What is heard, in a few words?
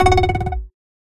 alert, notifications; Android